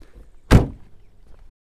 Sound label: Car Door Shut Vehicles Land